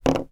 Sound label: foley bottle cork place down on table